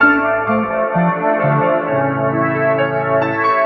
piano and key, Sad Loops

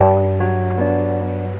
guitar, Loops

